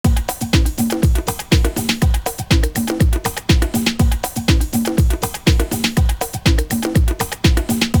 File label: ELEC PERC; CONSTRUCT 2